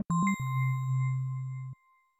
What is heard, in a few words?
Gentle Bells, notification Calm; Samsung Galaxy Z Fold 7